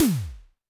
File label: TD-20 Tom A, Roland TD-20 Toms